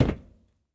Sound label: metal step; Golden Crayon Sounds